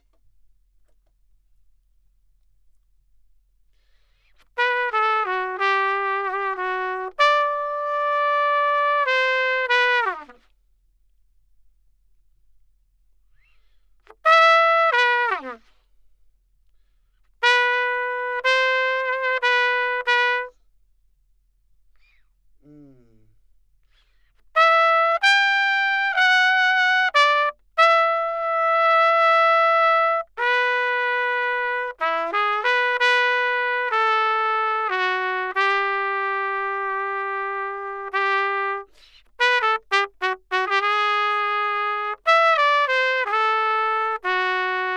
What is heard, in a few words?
Trumpet